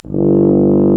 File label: BRS TUBA C; Roland L-CDX-03